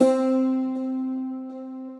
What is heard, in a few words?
Duelling Guitars; Placid Plucks